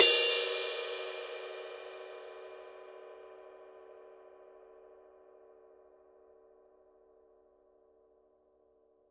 PAISTE TWENTY MASTER DARK DRY RIDE

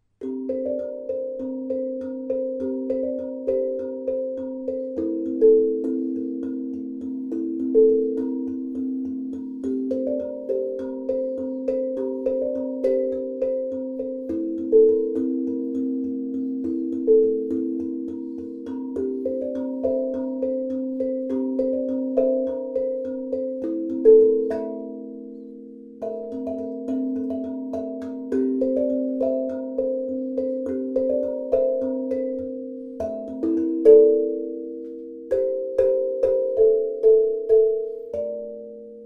C DO PENTATONIC

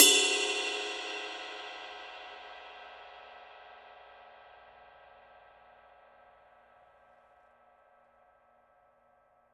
PAISTE TWENTY MASTER DEEP SIGNATURE GROOVE RIDE

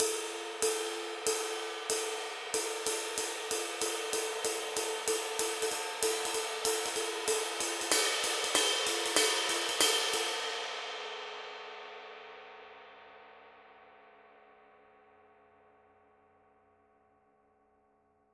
PAISTE TWENTY MASTER DARK CRASH RIDE

